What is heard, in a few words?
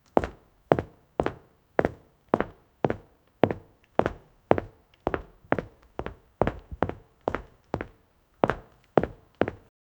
realistic footsteps on ro; surface